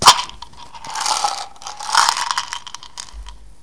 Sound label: Opening Bottle With Pills